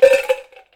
bucket hit

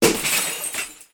glass breaking

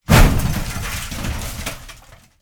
storage locker drop

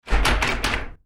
door lock check

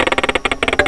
OPEN CRIK, wooden

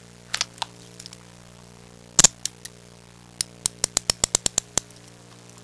HANDCUFF; handcuffs handling

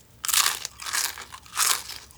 eating chips

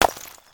stone drop ice

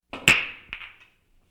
pool

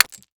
rock drop, rock drop and crack on concrete

